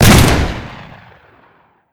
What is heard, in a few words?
shotgun fire